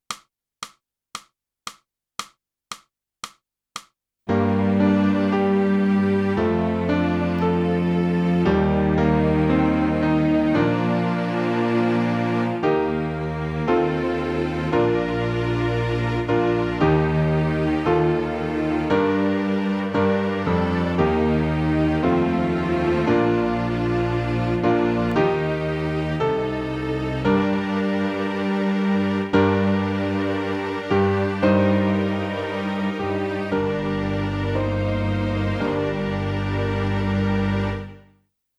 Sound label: clarinet; boosey method